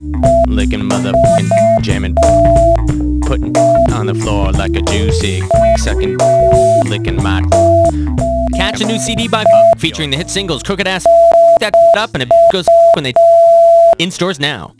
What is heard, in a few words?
Gangsta Beeps; You Don't Know Jack